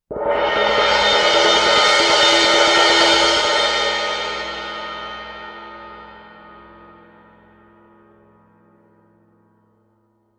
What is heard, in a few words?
crash mallet roll, Suspended Cymbals; Percussion